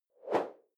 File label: whoosh simple short high; swooshes-swishes